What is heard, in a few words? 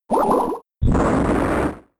Mud Bomb; attack moves; Pokemon; Gen 4; DPPL HG SS